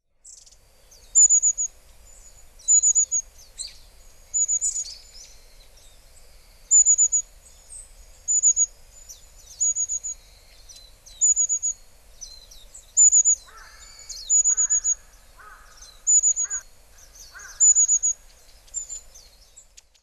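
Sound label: enaga, Long-tailed Tit; hyogo; koe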